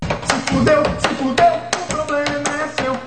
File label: knife; cstrike